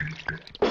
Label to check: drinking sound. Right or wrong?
Right